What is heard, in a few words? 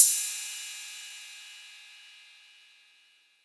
crash sound f key